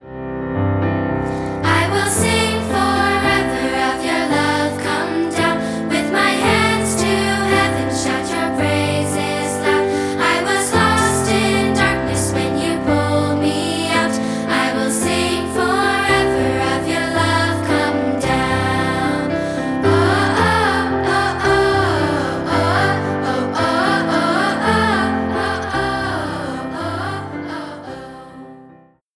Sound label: piano